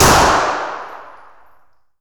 gunshot Indoors

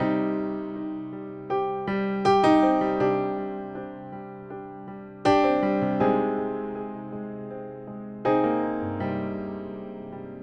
Emotional Piano

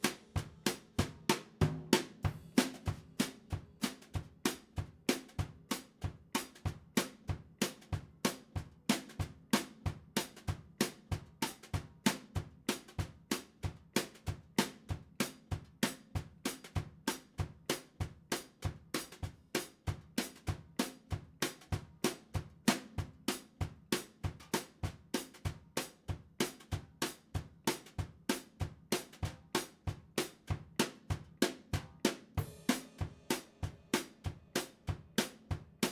Drum Over Tom

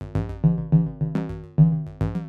808 Loop Toms 105, Roland 808 Drum Loops, 105bpm

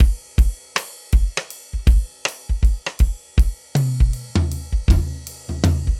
80 Acoustic Drum Fill, Live Trip Hop Drums; Loops; Smoke Signal

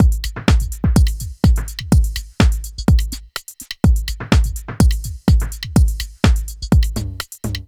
Club Dance Beat

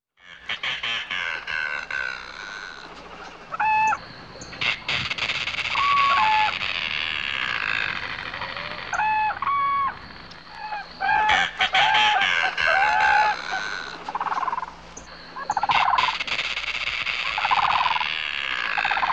Florida Glades (Cranes, Kites, Herons)

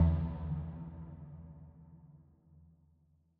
112 C Bass Tail, New Age Ambient Loops; Tail Samples; Spring

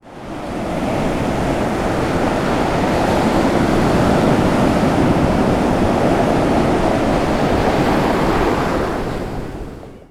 Ocean, ambience

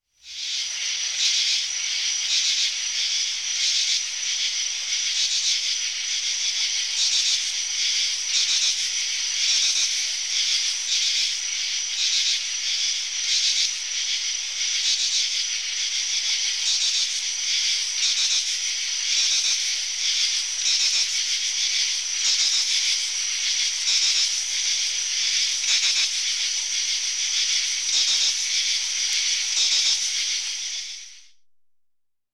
wood sounds at night

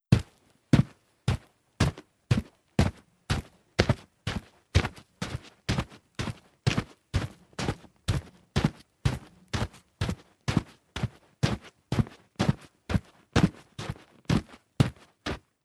Footfalls Dry on Wood; footsteps

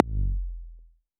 808 BD long, Roland-808 Drum Hits Bass Drum

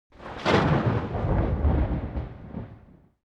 Thunder Clap Single, ambience

